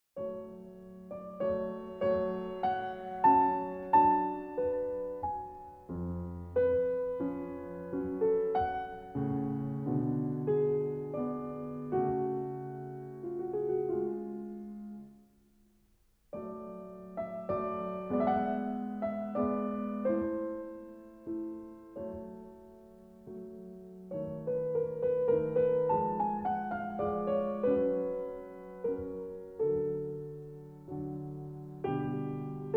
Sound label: Piano Concerto No. 23 in A Major, K. 488 II. Andante; Mozart, Wolfgang Amadeus